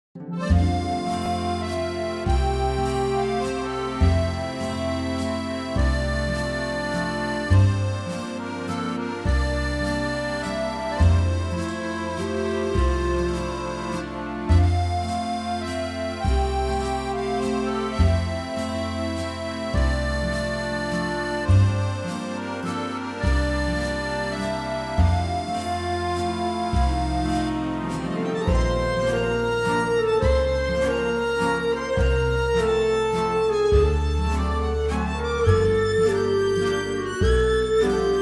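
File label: classic french music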